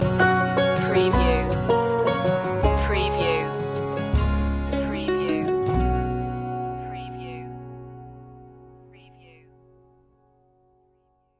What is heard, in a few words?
blue grass mellow stinger